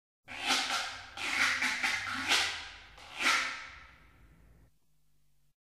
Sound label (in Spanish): Güiro